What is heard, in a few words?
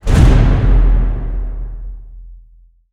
tombstone grab; undying